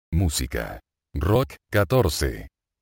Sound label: rock